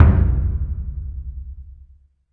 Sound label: big burundi, African Burundi Drums